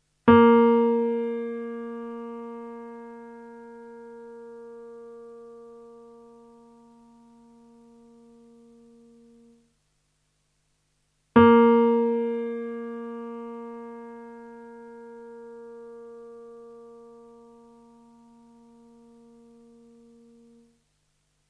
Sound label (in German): Stimmton Posaune b